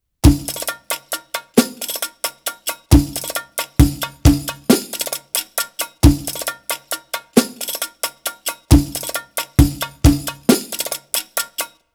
Arabic Rhythms

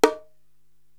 Bongo Lo Stick

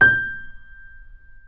Mp G 0 off, Grand Piano off mic; AKAI S6000 CD-ROM - Volume 2

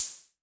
Hi Hats Open; LIMAT Div Dept

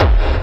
hard bass drumz

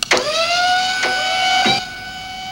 HYDRAULICS; Northstar; The Wizard VOL-1

